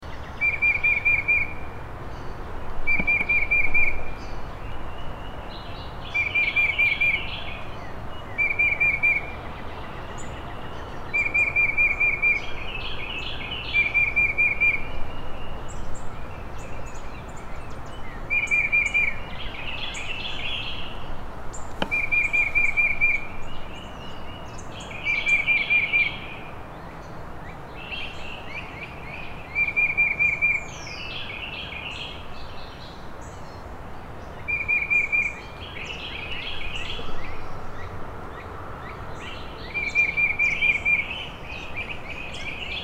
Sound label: Tufted Titmouse